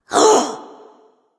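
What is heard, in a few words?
nonverbal surprise; smite; ratatoskr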